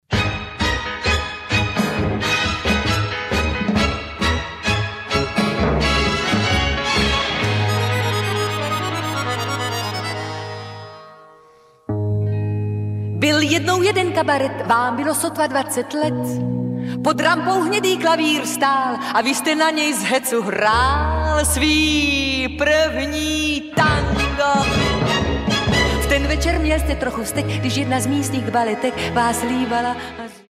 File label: tango